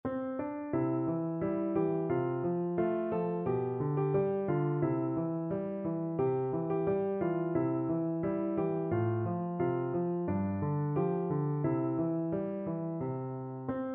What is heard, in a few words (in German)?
muss i denn, piano